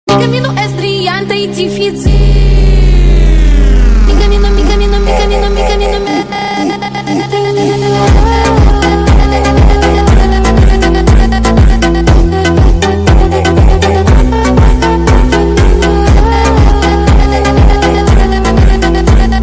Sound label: funk phonk